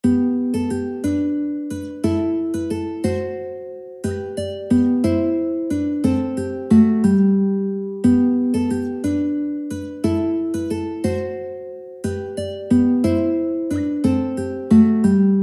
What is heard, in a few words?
lilli burlero, mandolin